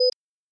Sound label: time up warning